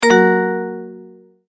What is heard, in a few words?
multimedia tone end